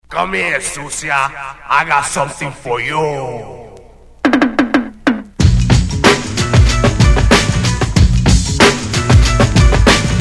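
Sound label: hip hop, Reggae Beats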